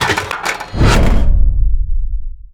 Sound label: UI LOCK